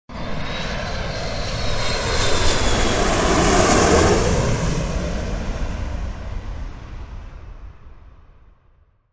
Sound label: Passenger jet flies right overhead.; Jet Fly Over 1; Airplanes; Vehicles